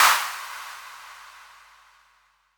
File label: noise fx; Masterbits; Switch FX